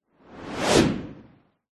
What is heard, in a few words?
sound design fast whoosh; zapsplat